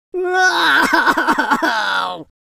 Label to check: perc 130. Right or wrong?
Wrong